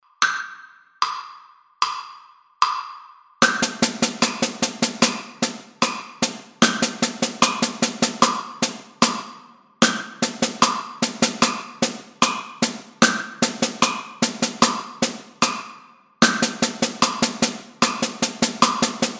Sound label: drum set